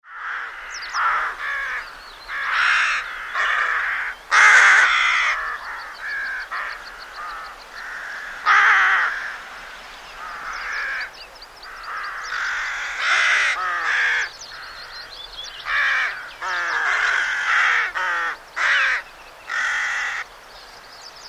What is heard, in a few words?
rook